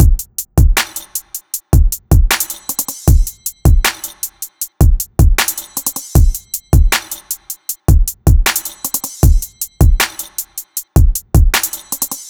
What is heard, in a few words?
Rage Full Drum Loop 156 BPM